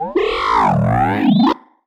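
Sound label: Sci-Fi Droid Robot Emote Neg; JASEM: Just Another Shooter with Electronic Music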